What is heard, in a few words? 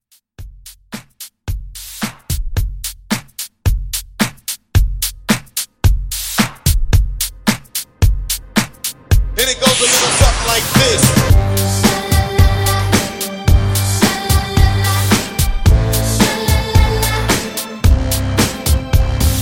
Pop Redrum